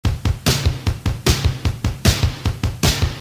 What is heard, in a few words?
depressing rock music